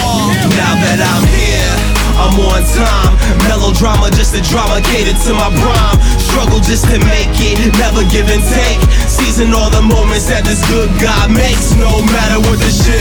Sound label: Melodrama